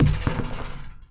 Street Ball Acrylic Basket; SFX Kit

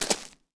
gravel; firefight

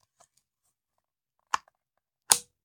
Machine Gun Foley; Sound Ideas; Guns

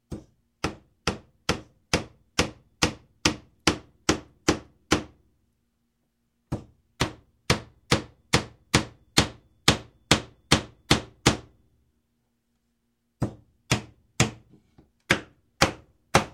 Construction, Hammer Hammering Nails Into Wood; Sound Ideas 2000 Series

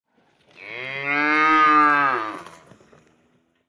animals cow; Blind Drive

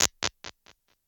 282 Delay TAPE, Impulse Responses; Reverbs; PastToFutureReverbs; SST; CLA; IRs

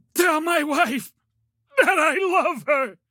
town human far; Blind Drive

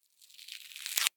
Band Aid Pull Off; Medical; Blastwave

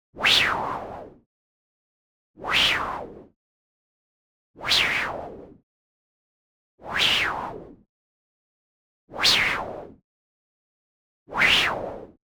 MOVEMENT FAST White Noise Modulated Tail; boom library; Anime Essentials; DSGNMisc

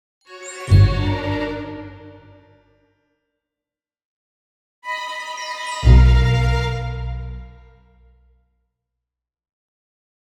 Orchestral Jingle Negative; boom library; The Interface

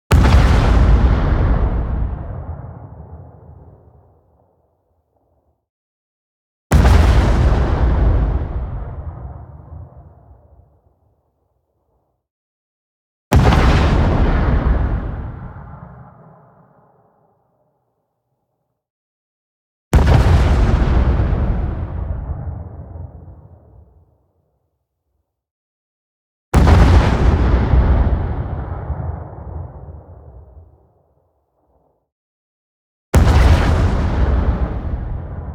EXPLOSION LONG Sustained Low End; boom library; Anime Essentials

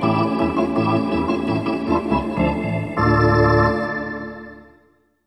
Hockey Organ

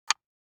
DICE on Wooden Tray, Grab, Standard, 2 Two Dice; Samples; ArticulatedSounds; DICE Sfx Party (Extended)

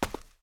concrete; firefight

